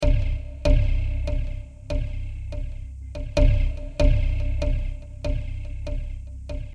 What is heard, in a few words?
loop perc